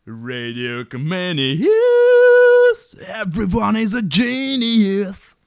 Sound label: jingle